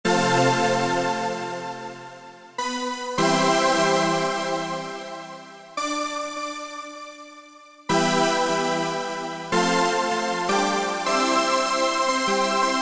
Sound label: SYNTH TEXTURES - Stabs; Manori Stabs; Apple GarageBand 2